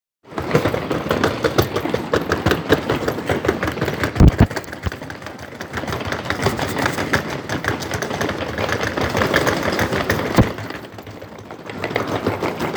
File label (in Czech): Kufry